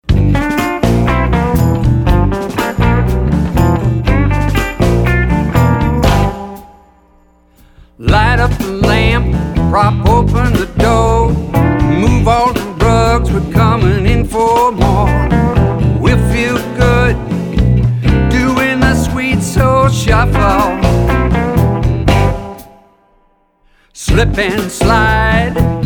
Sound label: Sweet Soul Shuffle